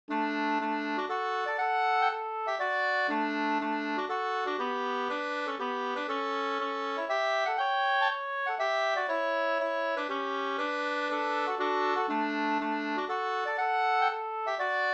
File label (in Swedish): polska Bodapolska D dur polskan